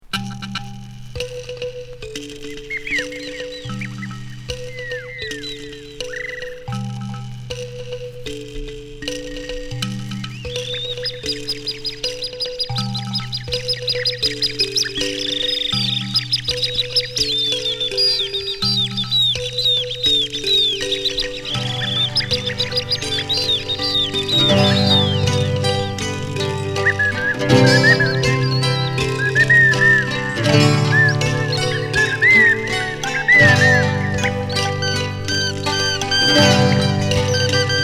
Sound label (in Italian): strumento concerto